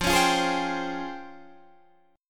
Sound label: Db Major Sharp 11th F